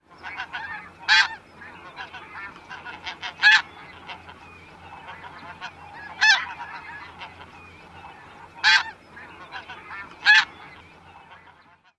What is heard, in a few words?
individual calls